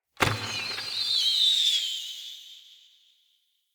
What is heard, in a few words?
Bow Shot Arrow Rain; Weapons; Josalfar Bow